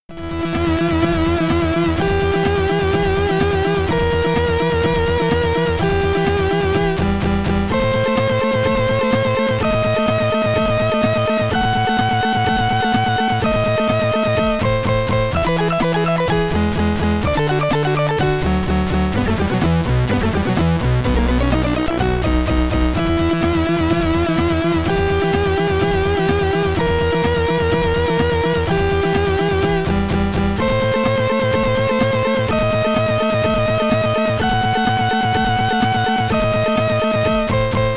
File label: SID Stereo; toki; commodore 64